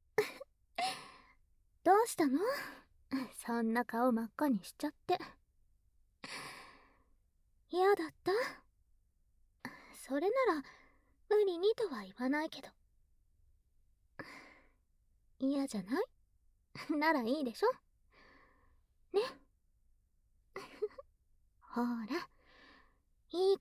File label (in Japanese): 耳かきで癒しタイム; 後日談